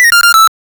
8 bit soft clean 2 notes; chiptune

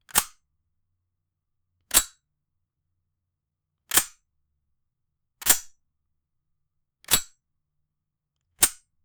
fast opening and closing, knife

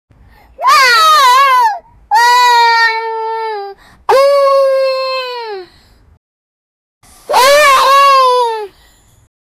teenage girl with a sweet

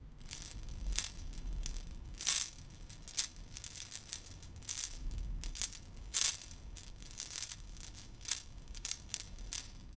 metal chain sound

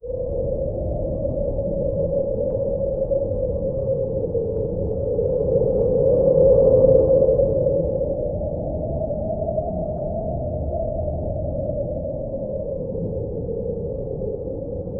windy planet that is scary

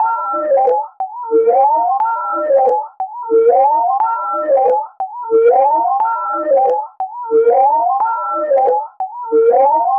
aura sound

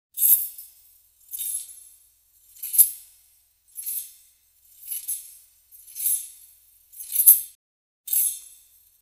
the katana is drawn from

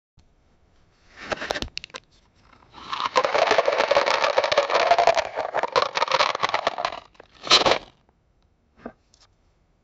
a 10 seconds long magical, items

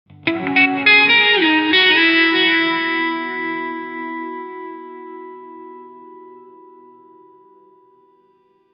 guitar solo climax echo

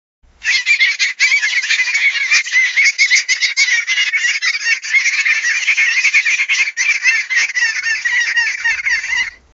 laughter of a multiple sp, spider

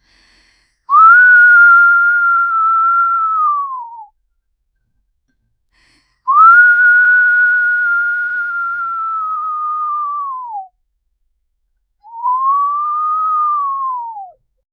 woman whining shy and fur; furious

